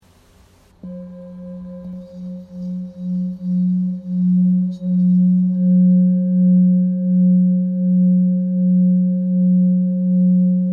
Bol bengale 1.3-1.4kg fa diese, bol chantant 7 metaux

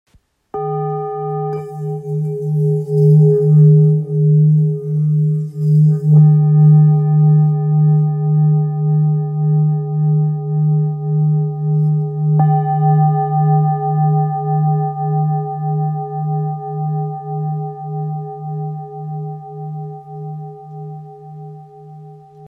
Bol ancien re

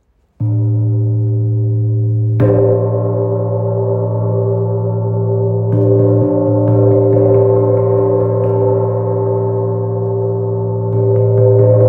Chau gong 60cm la diese

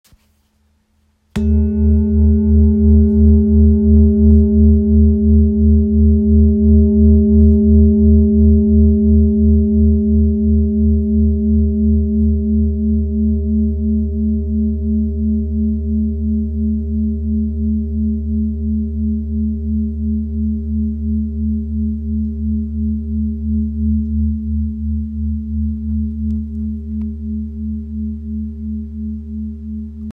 Bol ramgandi re

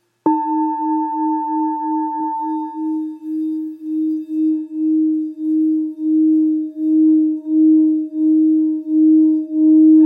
Bol thick sol diese

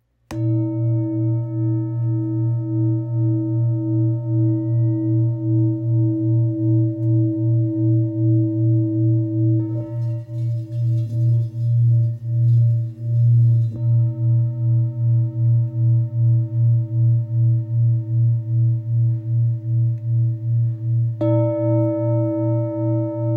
Bol ramgandi La 2.87kg

